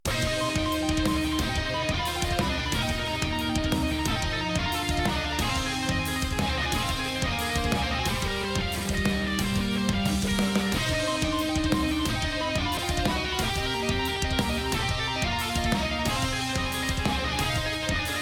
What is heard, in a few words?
chorus all no bass